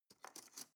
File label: cutlery teaspoon drawer grab soft